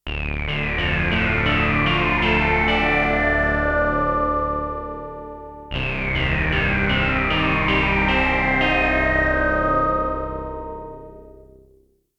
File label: AMS dmx 80S Obi chor